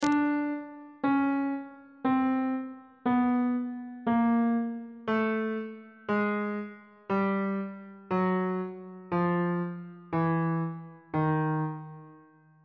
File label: d sharp chromatic scale descending on bass clef